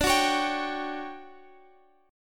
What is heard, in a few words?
D Major 11th D; strummed; JGuitar